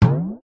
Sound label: cartoon hit impact rise pitch drum like